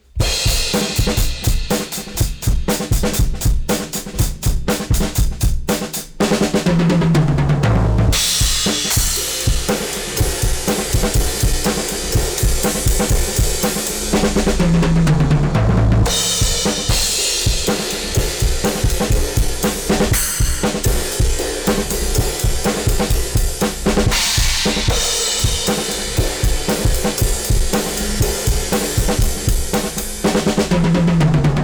drums; SKYE Clipper

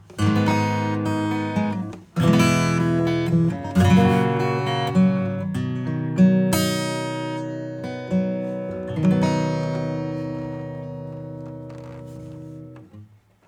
acoustic Jazz

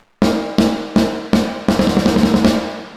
HALL MODULATION; MorphVerb

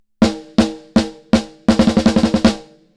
snare bypass

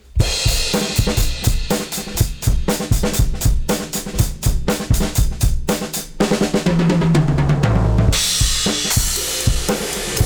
drums; Xtressor

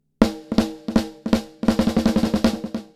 TONE BASS; GEM Echoson

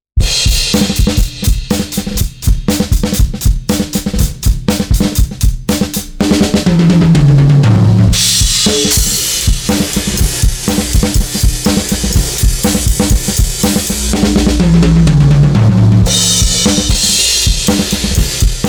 drum; Gem Comp 670

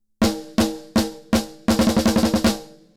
Drums Snare Top Pop

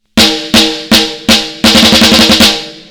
snare middle over

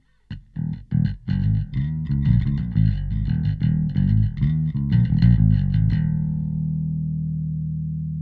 bass; Gem EQP

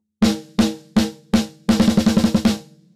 snare Acoustic Snare Body; Neutron 4

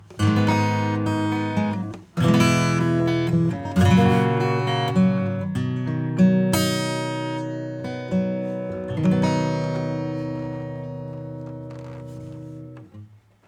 Acoustic bypass

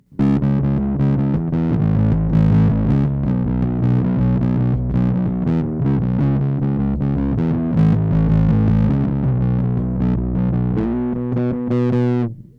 Bass test

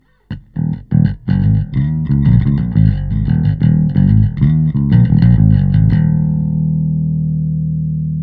bass DI; Joe Chiccarelli

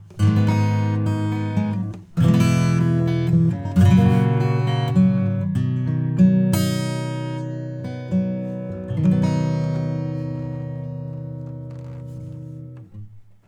Compressor Vintage 3band; Neutron 4

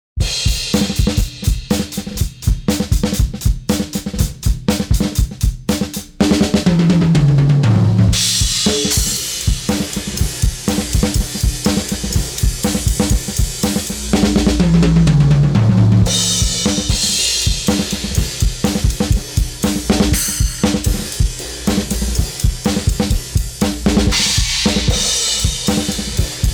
drums Tube 60s

